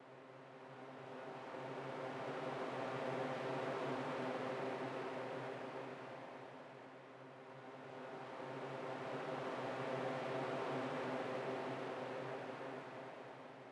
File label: dron, ambient savanna; World of Tanks Blitz